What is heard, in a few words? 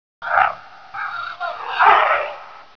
alien call; ambience